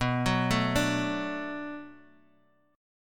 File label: B Minor 7th B Guitar Open D, chord